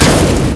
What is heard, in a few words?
CRASH, 碰撞声音素材